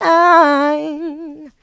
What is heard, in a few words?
voc, female vox, Vocal House